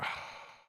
Taunt bottle ah

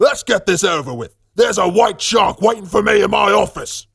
battlecry; Saxton Hale

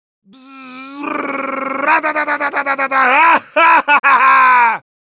yell, es

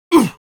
pain sharp, es; Soldier

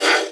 sapper snap

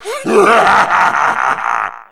Knight laugh

